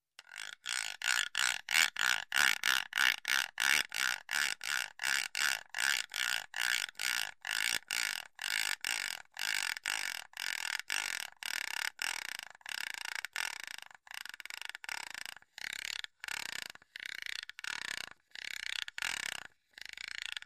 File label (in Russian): zvuk derevjannoj treschetki